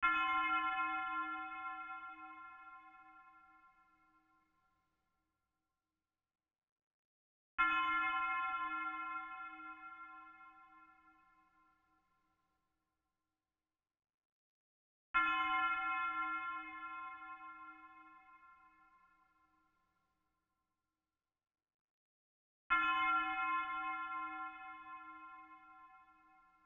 127 BPM F Min Bell; Trap Melody Loops; Loop Stems; Cymatics; Nightmares; Major

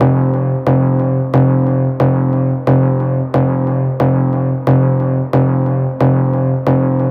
Synth Loop F 135, Techno; Ghosthack; AC2024 - Day 1 - Techno Sounds 2025

